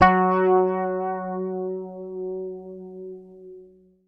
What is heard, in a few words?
F#, Bass; Sampleheads; Will Lee Bass Library